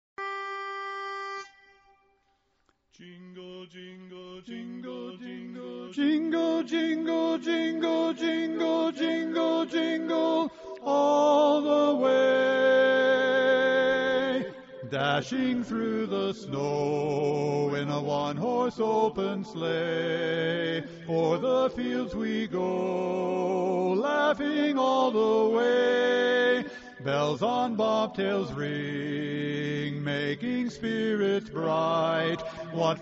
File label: Jingle Bells Medley Lead; xmasaudio